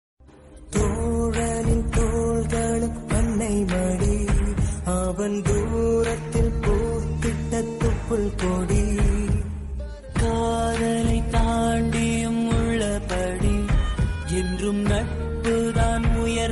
tamil friendship ringtone tamil friendship bgm tamil friends bgm